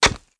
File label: get hit; Immortal